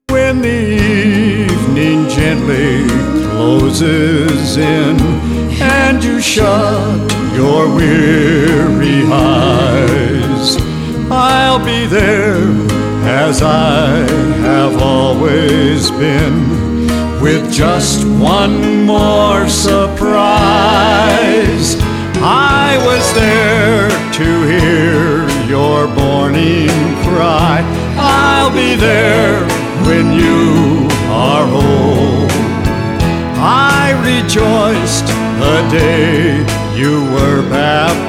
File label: BORNING CRY